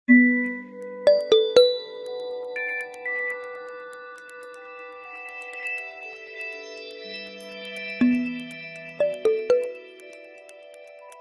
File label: Soft Notification